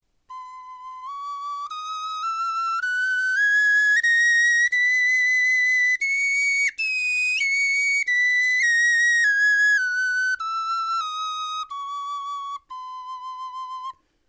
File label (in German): tonleiter, knochenfloeten